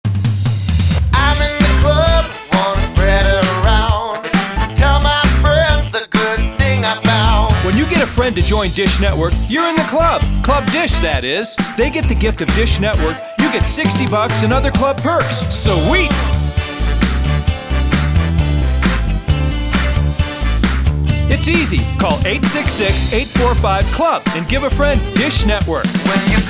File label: Club Dish Funk